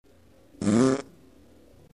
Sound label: fart slow